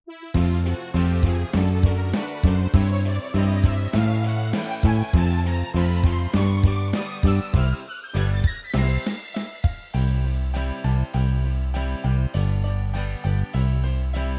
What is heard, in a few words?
Karaoke